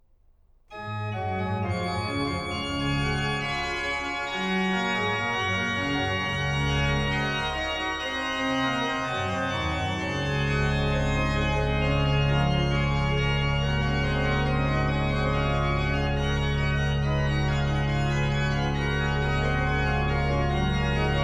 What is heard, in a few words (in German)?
Praeludium C-Dur; BWV 545